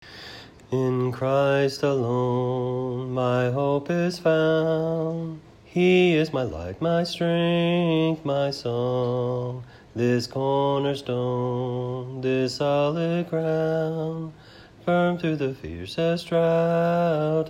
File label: ALTO